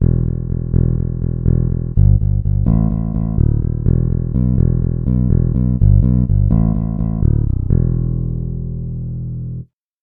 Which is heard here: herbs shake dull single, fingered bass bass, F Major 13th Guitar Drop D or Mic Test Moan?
fingered bass bass